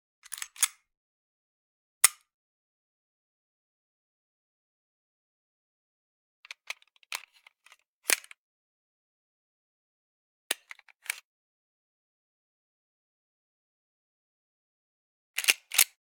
.45 ACP Chamber Round, Mag Insert & Remove, Unload; Hollywood Edge; Heavily Armed